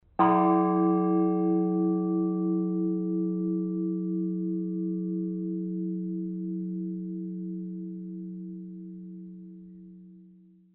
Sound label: russian bells; zvons